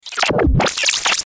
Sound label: Glitch Digital Electric Short Warble; glitches; Blastwave